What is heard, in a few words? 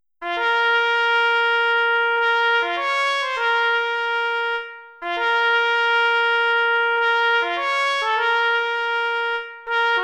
Trumpet; Band Audition